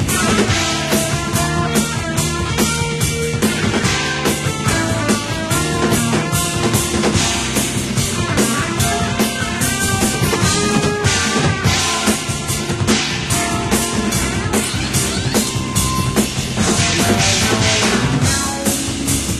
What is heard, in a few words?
steel, music; sven